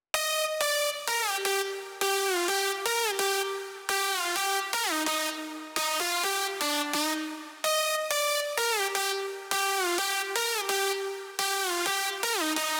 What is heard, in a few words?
128 BPM C Min Lead; House; Drop Loops; Cymatics; Pastel Rain